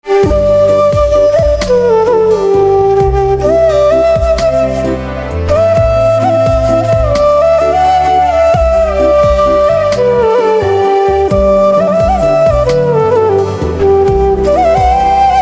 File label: Flute